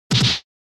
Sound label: Hit Punch Dry